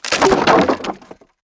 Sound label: objects, World of Tanks